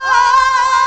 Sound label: AHH, Techno Trance; E-MU Formula 4000 Series Vol. 2; Orbit Presets